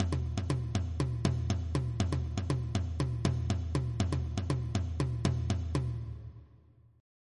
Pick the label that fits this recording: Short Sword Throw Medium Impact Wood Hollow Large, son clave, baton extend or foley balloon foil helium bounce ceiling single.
son clave